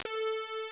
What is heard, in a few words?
square wave